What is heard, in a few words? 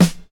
short snare drum f key, Royality free snare drum sample tuned to the F note.; snare drum samples